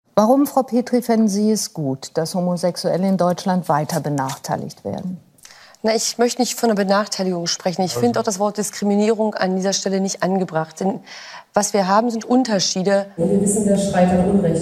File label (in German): schreien